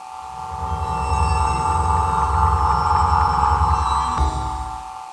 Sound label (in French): onde; MOBICLIC 13